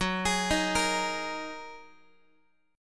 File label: F sharp 6th Add 9th F sharp Guitar; arpeggiated; JGuitar